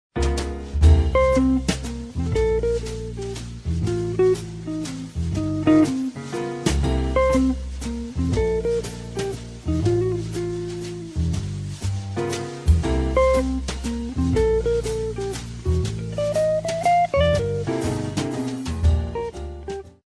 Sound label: jazz lounge